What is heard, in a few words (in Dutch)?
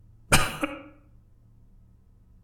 hoest